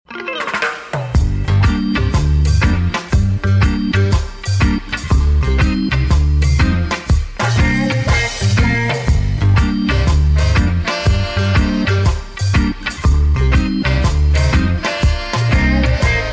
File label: Reggae; honduras musical 9no grado